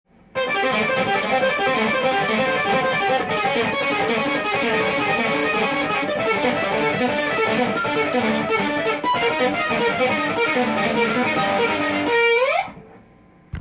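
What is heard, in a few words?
two-hand tapping, guitar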